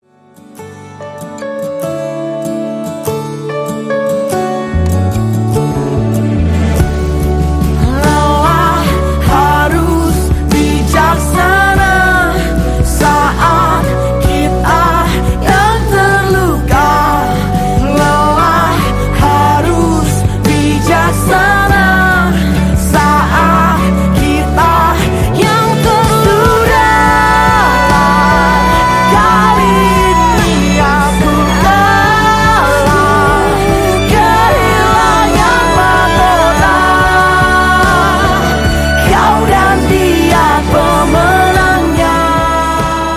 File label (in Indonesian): Akustik